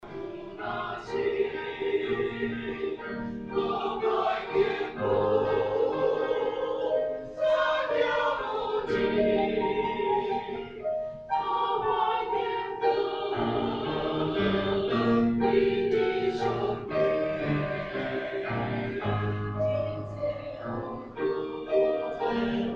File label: Choir; taiwanese